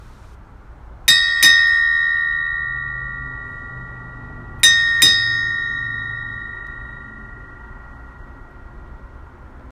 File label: Silver Perko Bell Small